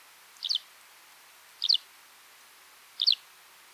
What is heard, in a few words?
Eurasian Skylark, Feldlerche